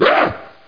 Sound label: Yell